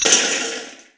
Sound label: door chainlink close; doors